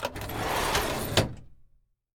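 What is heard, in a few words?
DRAWER open; 1000fx; Doors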